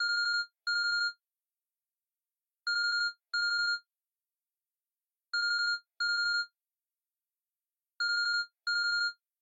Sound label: Ringtone; LOOPERS